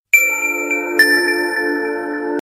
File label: xperia x5 mms